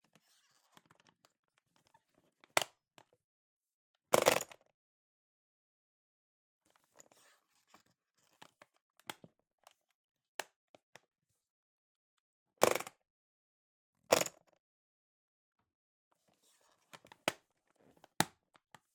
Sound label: Briefcase, Latch, Open, Release, Close, Squeaky, Series; Office